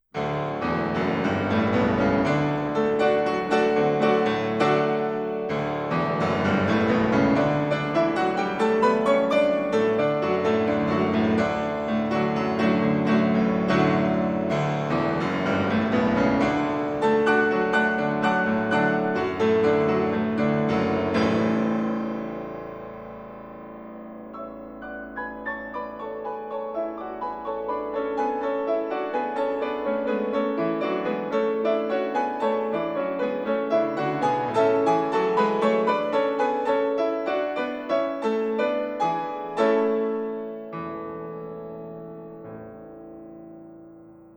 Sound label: prelude and fugue in d major